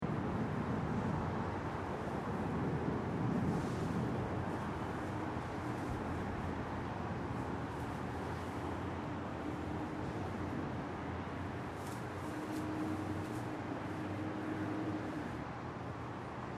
Urban Background Short, General city soundscape.; Lax; Jets overheat, buses and distant traffic.; City Sound Effects; Lynne Music

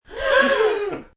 Group Gasp Short; Gasp, group. short (3-5 people) (human voice); Gasping; Sound Effects:Voice; Voice Box

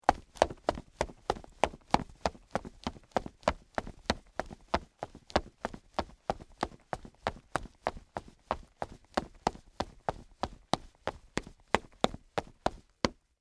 Female Boots Run, Footsteps, Wood, Surface; BlastWave

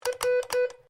MORSE CODE R; Morse code aplphabete; Morse Code (Sound Effects:Electronics)

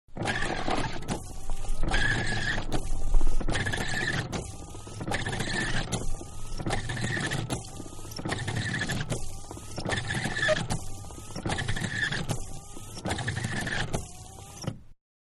Mid Size Car Windshield Wiper, Slow, Exterior; Cars; Vehicles; Manhattan Production Music

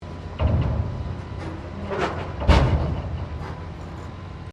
Construction mechanical claw movement

